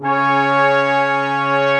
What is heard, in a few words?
BRASS2 C4, BRASS